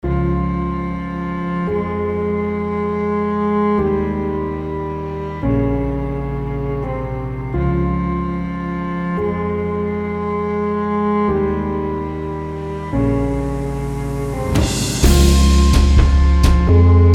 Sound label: Instrumental